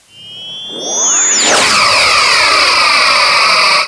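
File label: phaser; Star Trek Deep Space Nine - Entertainment Utility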